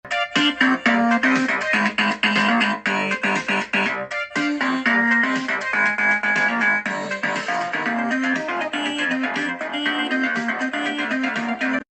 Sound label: random shitpost music